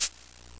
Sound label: CABASA; MUSIC; PERCUSSN